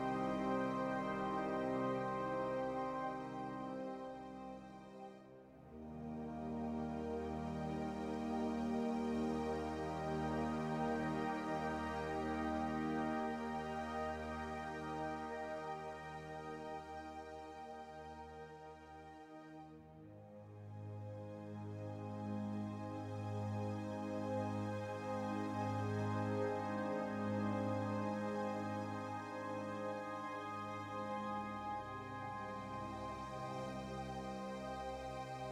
waltz Loop

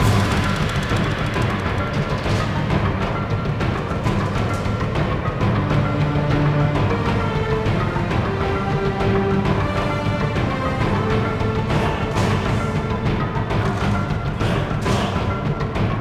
Arena Forest Combat; Dungeon Hunter 3; android ios

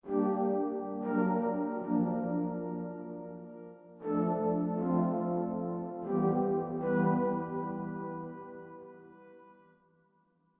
Stingers Trumpet Decayroom Short

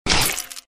Sfx Enemy Murloc Dead

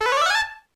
Sticker rollover correct; LittleBigPlanet 2; PS3

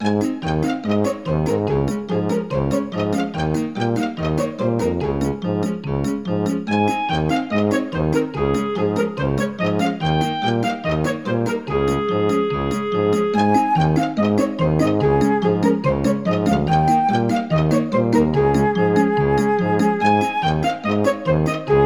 Generic Minigame; peppa pig world adventures

